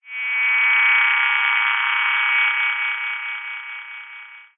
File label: science fiction radio receiver transmission interference burst harsh whine; zapsplat